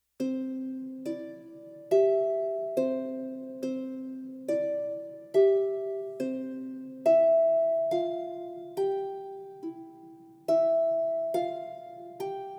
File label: Harp Version; Brother John; Tiny Tunes